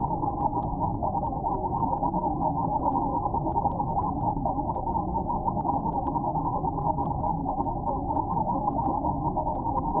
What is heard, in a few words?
writing